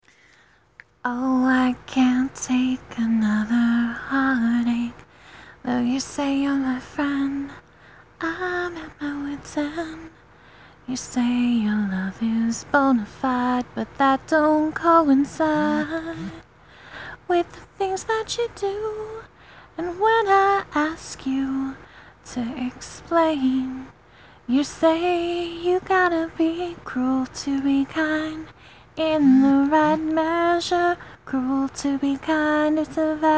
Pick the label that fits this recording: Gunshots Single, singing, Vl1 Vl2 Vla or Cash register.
singing